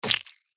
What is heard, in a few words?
Worm squashed organic impact; slug and worm